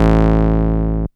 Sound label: Bass Long A; VEC1; Club; Long Basses